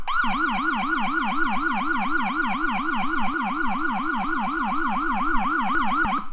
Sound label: Y249 and Howler